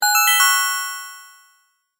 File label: Multimedia Positive, Technology, Multimedia, Positive, Element; Alert; Alerts; BlastWave